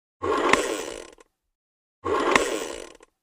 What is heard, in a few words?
Detonator Plunger; Explosives Detonator Plunger Being Pushed; Two Times, Sliding